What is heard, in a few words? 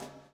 SLICK, Backbeat